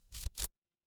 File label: paper fabric card flip